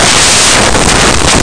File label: potion